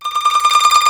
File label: koto tremolo; Greytsounds; Scott Peers Sound Engineering